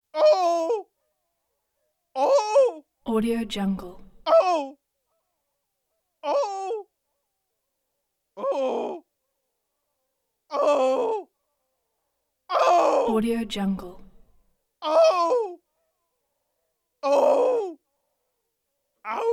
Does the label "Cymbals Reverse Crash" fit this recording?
No